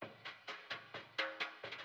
Store Fill

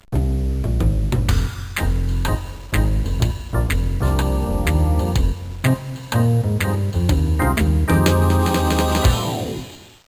Fanfare; putt putt and pep s balloon o rama

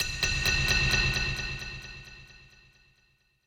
BGM stinger, BGM Player Dying Out; Babylon's Fall

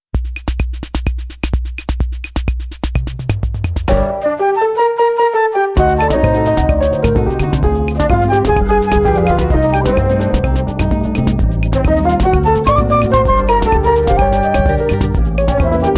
SAMBA; The Conveni 3 Ano Machi wo Dokusen Seyo; PS2

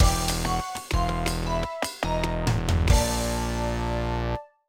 Lose; Aura-Aura Climber; Sky Jumper Sol; DSiWare

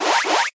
Find Boss

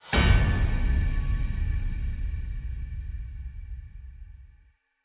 Stingers sting companion

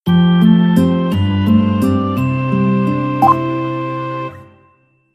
Level Success; super wiloo demake; ps vita ps4 switch windows xbox one gamerip 2019

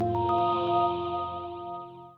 Air Dash Flower Hat

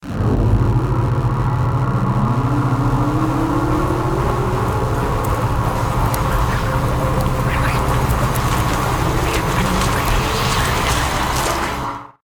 blasted death

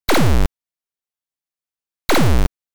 Enemy Shot; Oretachi Game Center Zoku; Time Pilot

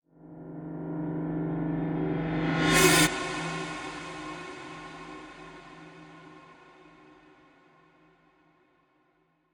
Piano Eerie Stinger; Layers of Fear Inheritance

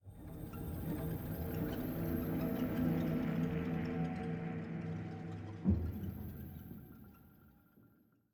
85bpm

